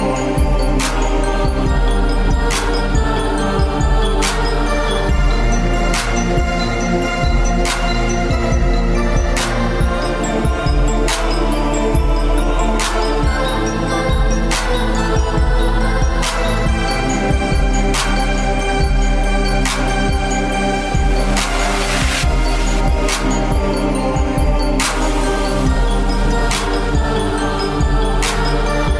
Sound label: Soul Music